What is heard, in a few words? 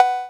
cowbell electro, misc percussion